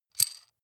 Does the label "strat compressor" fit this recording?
No